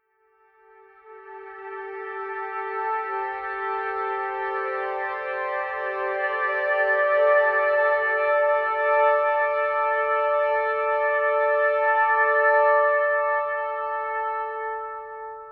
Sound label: piccolo trumpet overlay loop; the detail